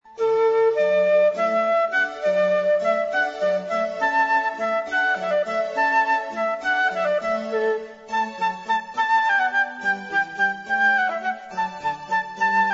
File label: Hornpipe; repertorio para bodas; Haendel